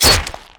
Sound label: Backstab; 100rogues